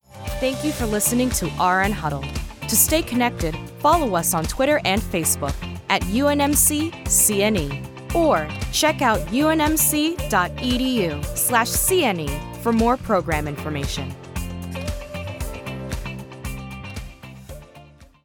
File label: Outro And Music